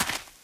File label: dirt, footsteps survivor walk; Left 4 Dead 2